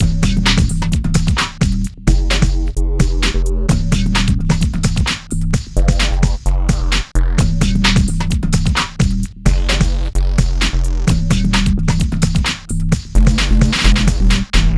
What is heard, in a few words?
Hardcore Breakbeat, 130 Bbmin; Big Fish Audio; Hardcore Breakbeat Action